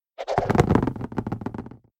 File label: Roll